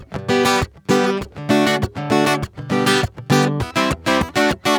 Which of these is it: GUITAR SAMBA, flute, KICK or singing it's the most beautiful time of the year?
GUITAR SAMBA